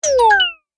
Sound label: Game Machine, video game sound effects